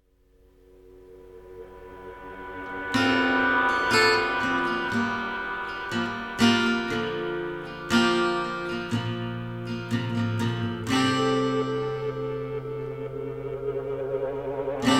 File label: instrumental